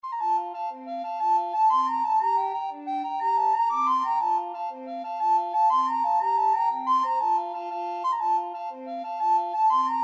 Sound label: irish jig medley, recorder trio